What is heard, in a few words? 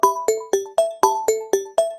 ringtone